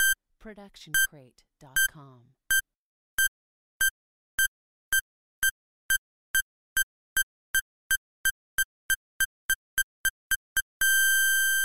Bomb Beeps Increasing

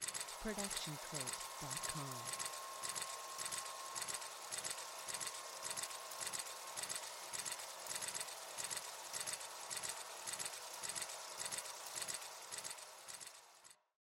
film projector sound; soundscrate

